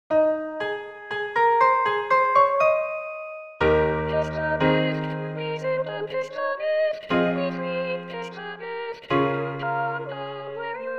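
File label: Simple gifts Tenor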